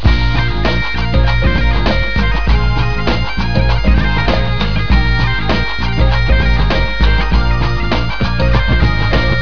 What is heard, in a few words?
bagpipe